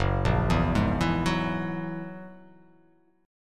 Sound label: F sharp 9th F sharp, chord